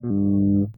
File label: bass; fofix